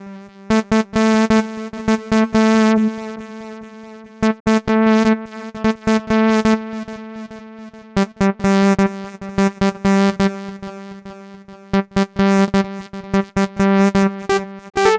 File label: Lead, EDM; Sonic Foundry (Sony Creative Software); Afterhours EDM